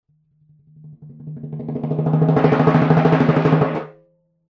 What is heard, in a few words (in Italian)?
Crescendo